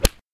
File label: Golf Long; Grow in the Hole; Zolopher; Golf 5 Long